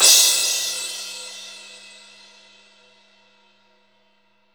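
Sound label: 18 crash, Zildjian; Best Service; Real Mega Drums VOL-1; WHGM KIT 1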